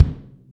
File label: 3qtr damp hi kick f, deep house single hits